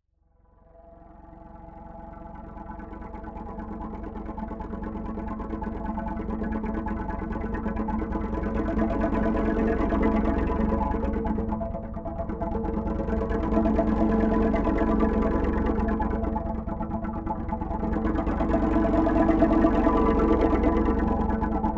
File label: Bass Box Deep Roll